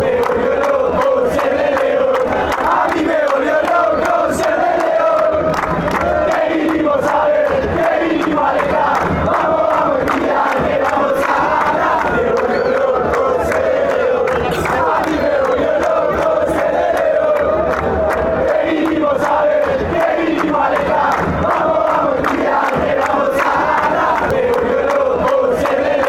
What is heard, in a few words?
being lion fan, fan chants